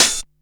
HH CLOSED; USB Soundscan vol.20 - Fresh Disco House I

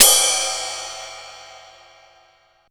DANCE SET, DRUMS

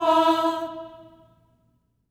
STACCATO E4, Symphony of Voices; Spectrasonics

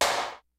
HOUSE KIT, DRUMS; Kid Nepro; Elite

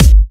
Electro Kicks

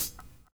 GROOVE; Univers Sons

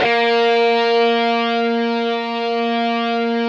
GIB SG A#3, DIST GUIT

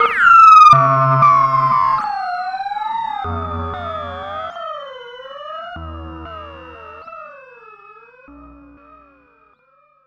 radiophonic; One Shot FX; Sample Magic; Transmission-X

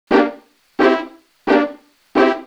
Ork Comp G, Lounge 088; Zero-G Kocktail Kollection; Construction Kitz (Lounge); Happy Timez Mixes 088

